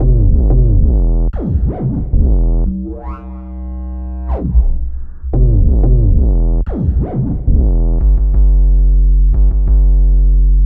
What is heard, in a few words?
Victory Bass, Drum & Bass; Fluid Dynamics